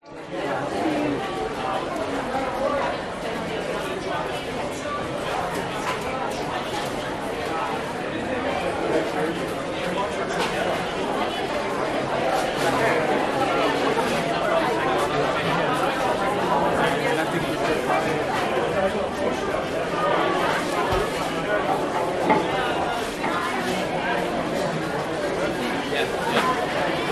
public places food court internal busy midday, Sydney; cbd australia